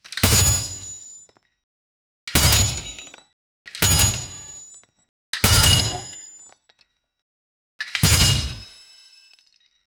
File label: mechanical grapple, winch